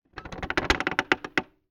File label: plastic stress; foley